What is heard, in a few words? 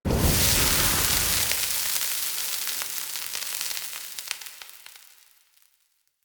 Fire Hazard Water Extinguish A; Undercity